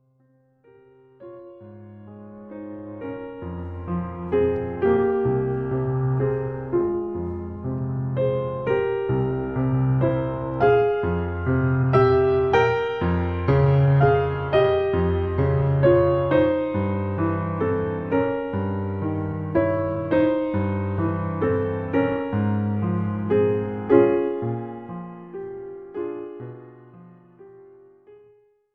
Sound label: HIGH VOICE; brahms piano